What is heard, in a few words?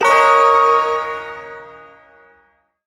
Ersatz Shawzin Yo Chord K; Warframe; Duviri